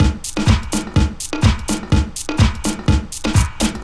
DEEPHOUSE BEATS 125

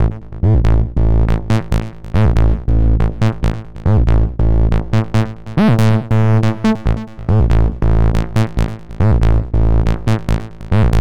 HARD BASS 140 A, Hard Trance, Bass

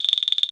ROLL; ETHNICSTUFF

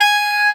A SAX POR G#4, Alto Sax Portato; Orchestral; VarazdinOrchestral

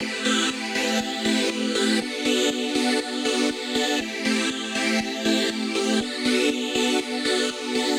120 Dm Summer Lovin, Synths & Keys; Loops; Touch Loops; CosmicFunk

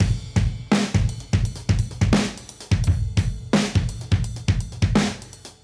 Modern Breaks; Touch Loops; ClassicBreakSessions

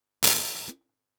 Hats, One Shots; Touch Loops; CosmicFunk

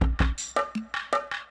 PERCLOOP ETHNO 160

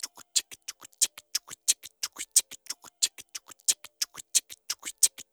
ETHNO EXTRA 90; ethno2

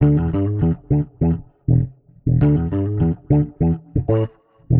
100 E, Warped Bass; Loops; Kootsy Knows; Touch Loops; CosmicFunk

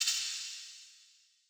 Shakers; One Shots; Touch Loops; CosmicFunk

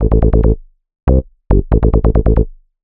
ELECTRO BASS 140 A

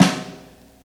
JAZZ SD, JAZZ-DRUMSET

